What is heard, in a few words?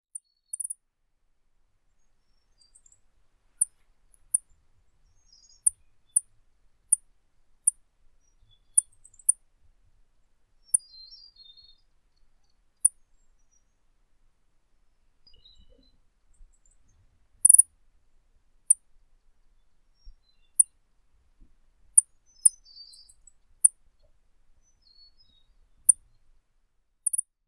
diglossa plumbea, Aves, Chirripo; Paramos; historia natural; Galeria biodiversidad